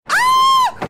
screaming

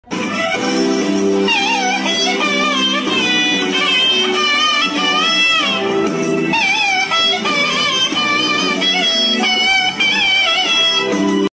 with flute; batman

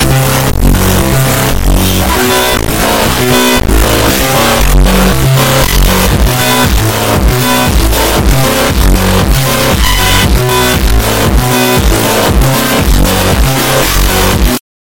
bass boost; salinewin exe

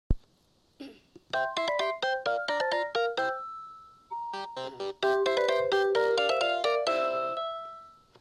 samsung sgh x530 a squirrel

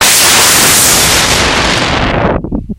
blow cymbal

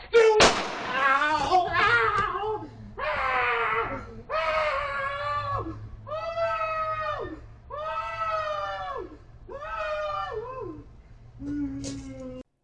oww oww screaming

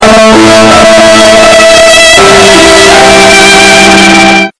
sad violin bass boosted

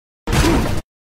oof

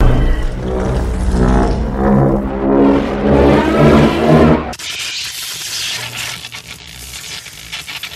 electro impulse; godzilla

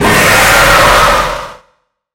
screamer; phantom jake

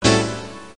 Windows 95 chord error

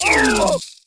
aaagh

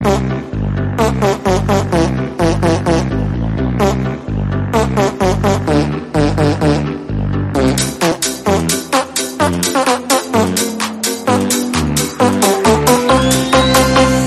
fart phonk

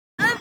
grunt quick

